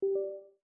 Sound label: windows 11 hardware insert dark